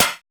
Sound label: NOIDAL PERC; TEK PERC2